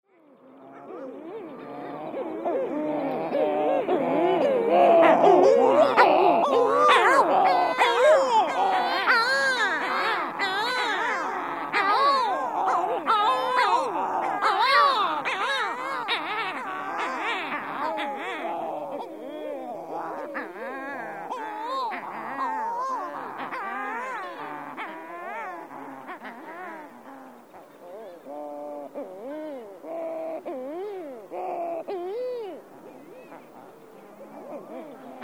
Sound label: Sooty Shearwater